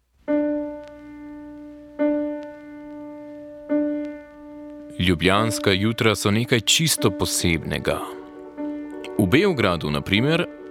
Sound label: jingle; objekt meseca